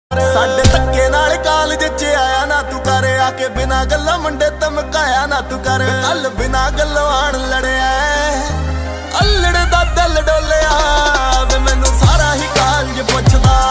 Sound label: Dance Punjabi Ringtone